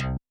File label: SLAP B, Yamaha SY85, Drums